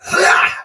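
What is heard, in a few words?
Exertion Soldier Sword Agent Male, Battle; Dialog; Barks; Melee; Sinecure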